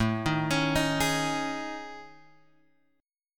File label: A Major 7th Flat 5th, guitar; AM7b5 Chord {5 6 X 6 4 4} arpeggiated